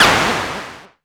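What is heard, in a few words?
Axxe snare, ARP Axxe Drum Machines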